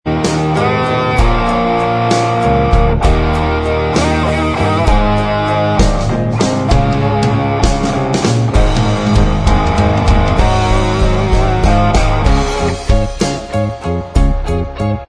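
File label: instrumental, backing tracks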